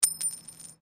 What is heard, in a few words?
Coin Penny Single Drop Stone